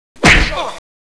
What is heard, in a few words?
pow; XMEN2